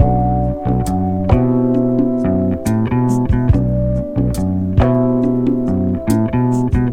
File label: keys, Loops, Sad